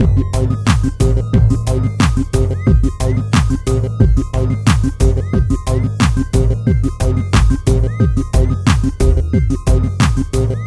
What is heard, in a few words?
beat